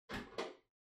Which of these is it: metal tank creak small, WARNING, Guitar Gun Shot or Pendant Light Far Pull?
Pendant Light Far Pull